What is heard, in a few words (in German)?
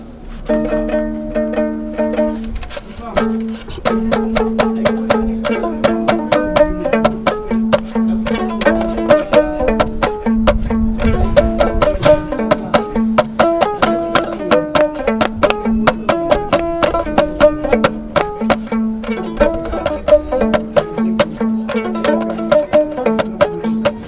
MUSIK; MOBICLIC 55